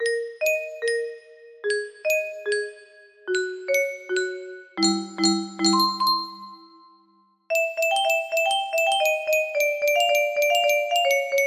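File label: music box melody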